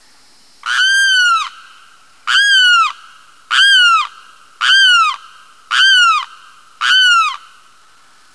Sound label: limpkin